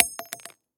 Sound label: Shell heavy carpet; STALKER; IWP